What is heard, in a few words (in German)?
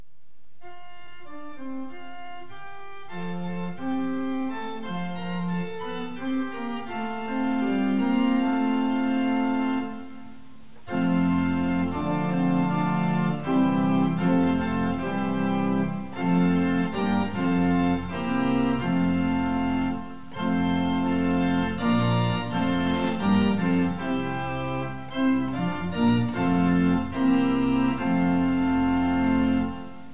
Orgel; SanktBriktiuskirche Oekoven